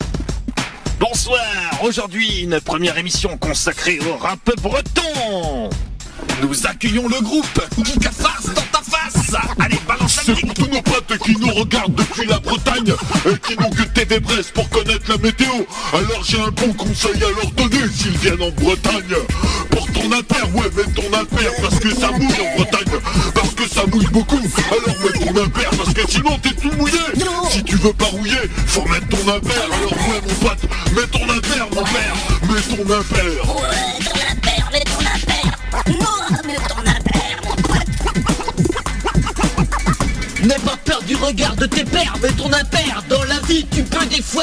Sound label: Rap breton